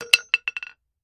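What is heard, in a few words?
Hammer Dropping Metal; hammers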